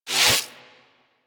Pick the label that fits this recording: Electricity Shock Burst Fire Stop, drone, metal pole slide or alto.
Electricity Shock Burst Fire Stop